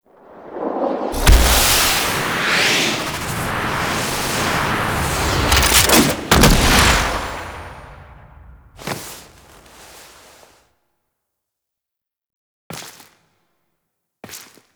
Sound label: Archwing Fly In; Deimos Intro; Cinematics